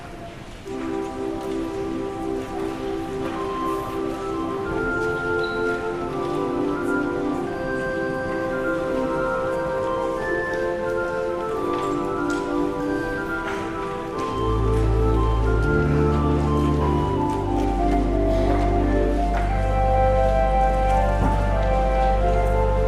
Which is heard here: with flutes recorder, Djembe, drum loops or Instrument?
with flutes recorder